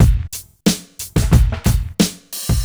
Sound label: Club Tip, R&B Drums